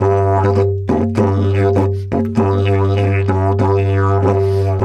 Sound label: G 100, DIDGE 100BPM, Didgeridoo